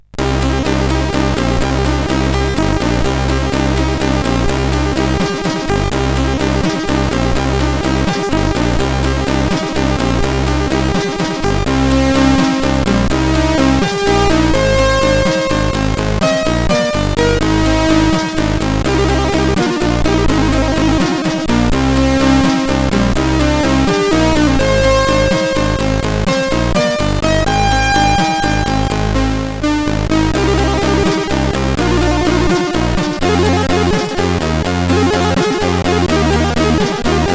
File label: Langsam, CSG8580R5